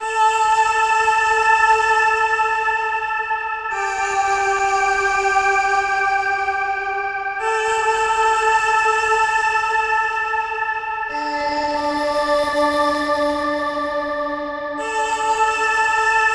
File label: Vocal Synth Am 65Bpm; Construction Kits; Ghosthack; SkyRealm; Solace in Falkreath